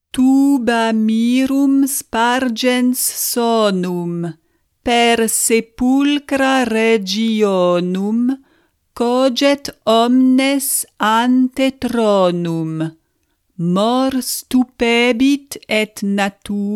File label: Requiem Tuba mirum Fast